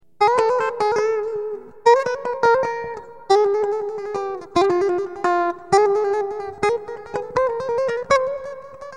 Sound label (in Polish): nietypowy trill plus podciaganie